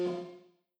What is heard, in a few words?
Mus Human Tsunami 120; From Dust; PS3; Xbox 360